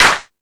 Crash, Drum Machines E-MU Pro-Cussion

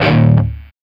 E 3 SOLDANO, Guitars, ROCK; Spectrasonics; Hans Zimmer Guitars VOL-1

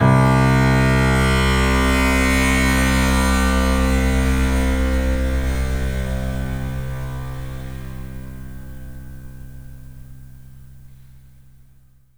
RATTL A#2; E-MU Producer Series Vol. 5; 3-D Audio Collection; 3D Multis

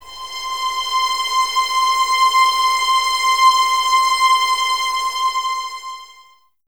VS C 6, 11 Violins, Mute; Miroslav Vitous; String Ensembles

